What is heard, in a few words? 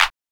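clap; E-Lab; X Static Goldmine 1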